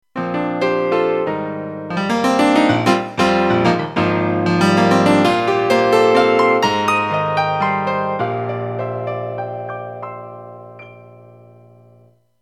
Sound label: Piano